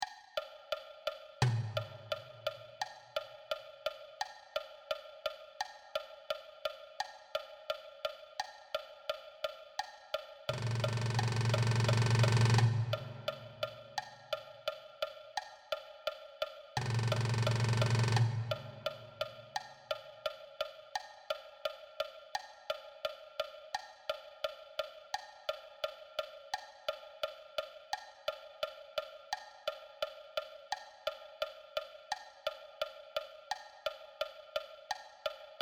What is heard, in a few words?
Percussion (Cymbal)